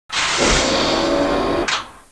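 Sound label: door open; Babylon 5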